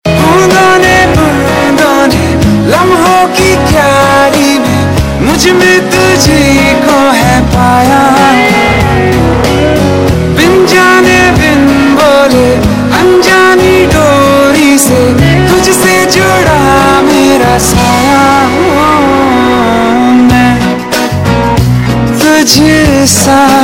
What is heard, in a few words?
Ringtone